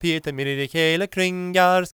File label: GAELIC, World Voices; ILIO; Vocal Planet